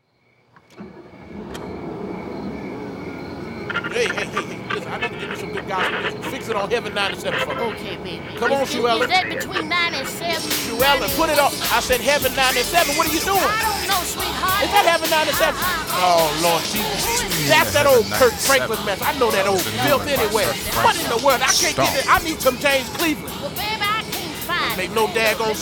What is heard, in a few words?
Interlude The Car Stomp